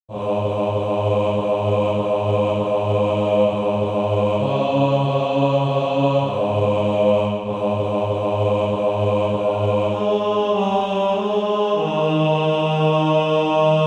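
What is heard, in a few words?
bass, Sheet music (SATB) including Practise Music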